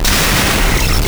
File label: scala r, 3D Imp Resp